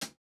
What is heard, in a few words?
hihat, house